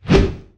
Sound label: preattack; dota; beastmaster